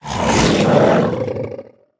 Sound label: Felhunter Play